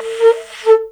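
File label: CONCH, CONCH SHELL; Spectrasonics; Heart of Africa 1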